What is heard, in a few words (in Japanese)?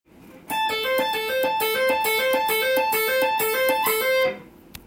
kantan taping; easy tapping phrases tab